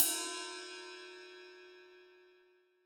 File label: ride sample f sharp key